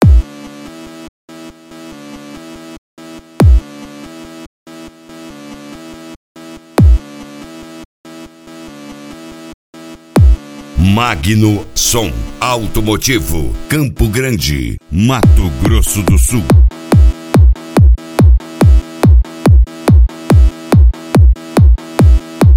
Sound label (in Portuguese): som automotivo; gauchinho som e acessorios e magno